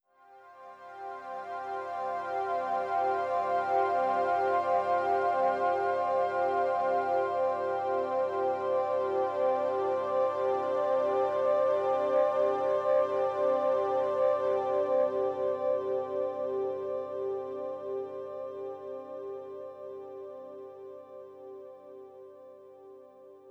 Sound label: Pad; Icelake; Pulse